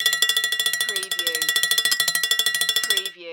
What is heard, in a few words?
Cartoon Bell Clicks